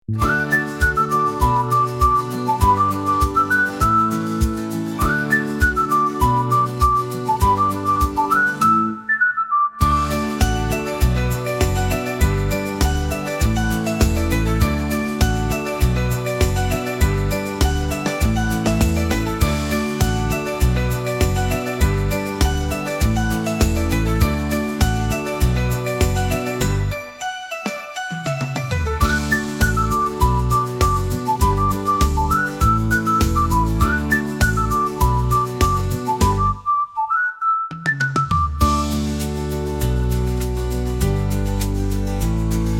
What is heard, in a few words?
background happy